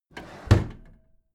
packed drawer smooth clatter close heavy halfway

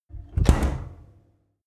fridge close

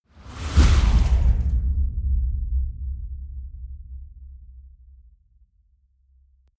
gust hit

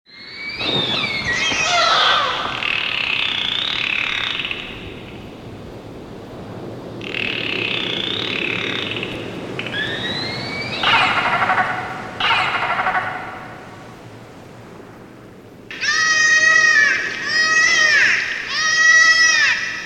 dolphin

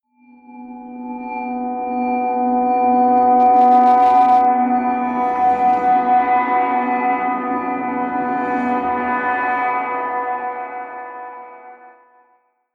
fading drone bell

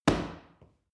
paw kick hard

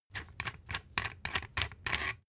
thread screw unscrew

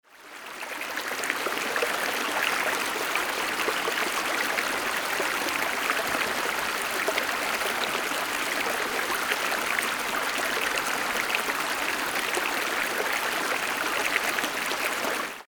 stream small

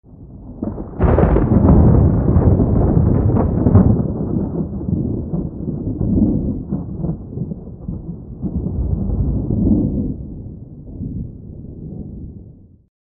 thunderclap light distant

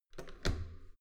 metal door heavy handle down soft

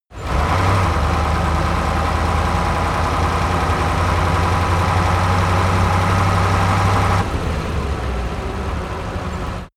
truck ural 4320 slow acceleration idle

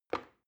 plastic button start release regular

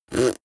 beatbox effect suck in damped

